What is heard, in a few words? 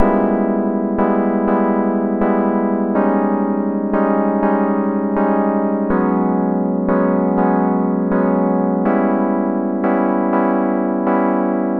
122 BPM Tonic G Bmaj Gmin Chord Progress; mmb chord progressions essentials